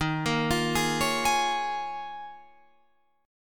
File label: D sharp 7th Suspended 2nd Suspended 4th, Guitar, Open F; D#7sus2sus4; arpeggiated